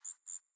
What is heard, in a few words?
Bird Double Chirp; Paragon Bird Guide